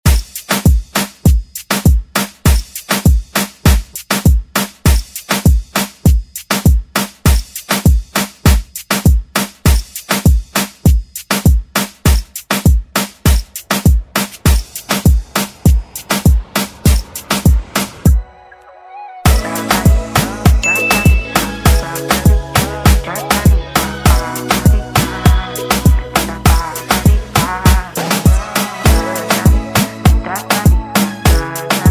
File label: Moombahton Afro Redrum